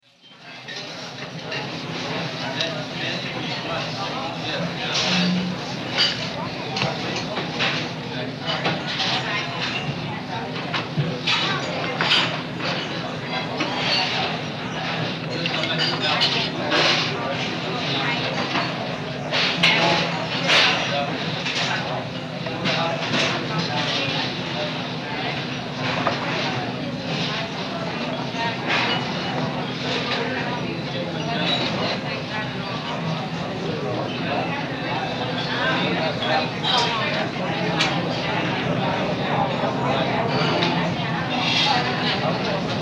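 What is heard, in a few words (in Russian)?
столовая самообслуживания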